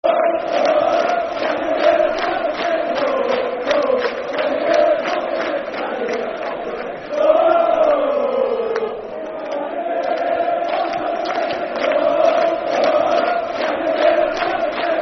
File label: Can You Hear The Falkirk Sing [Football Chant (Soccer Song)], Dunfermline; Dunfermline Dafc